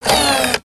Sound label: BroomBot servo; creatures mechanical